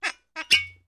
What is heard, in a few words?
Cork Pops & Squeaks; Squeaks